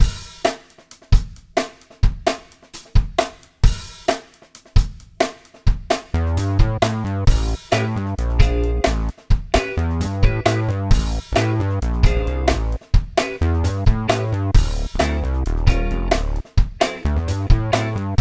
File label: Backing Full Tempo